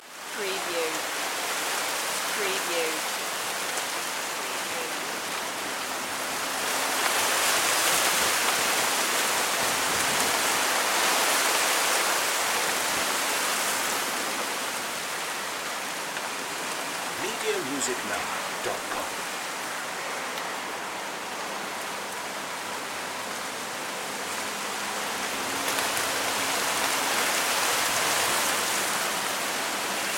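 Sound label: Wind Palm Trees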